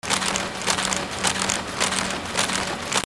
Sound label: INDUSTRY